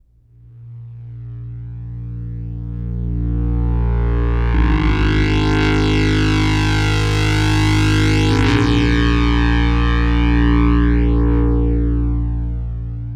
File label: Ingo Bass, Drum n Bass; KJ Sawka; Drum n Bass & Beyond